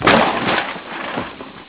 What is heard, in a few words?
kick door down